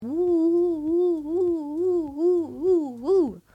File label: peggyx spin ooo